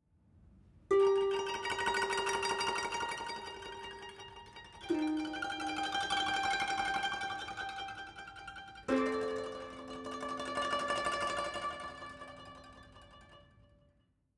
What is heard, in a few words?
Savannah Music